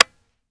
disconnector; gmod; viper

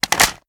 reload fast magin; viper

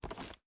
reload fast tilt; reloads; gmod

